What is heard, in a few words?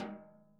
SNARE, Interactive Drum Kits